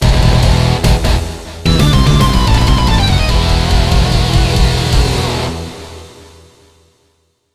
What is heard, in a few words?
bgm pot metal